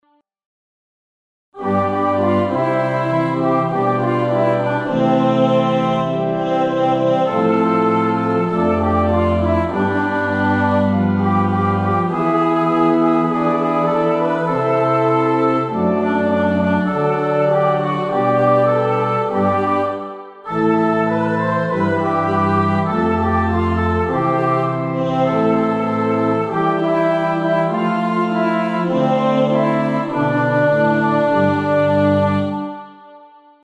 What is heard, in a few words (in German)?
Orgel